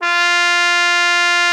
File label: OCUP F4, CUP MUTE; Xsample VOL-3